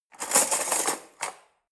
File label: cutlery forks drawer grab